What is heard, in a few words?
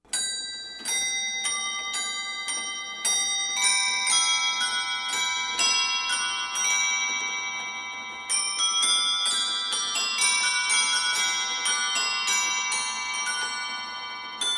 carillon clock; vallin